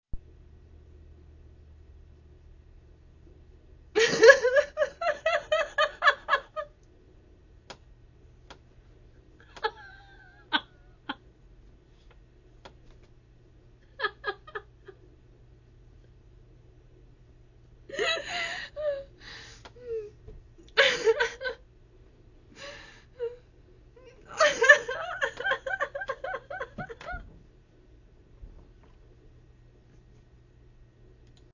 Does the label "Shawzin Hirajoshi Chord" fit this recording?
No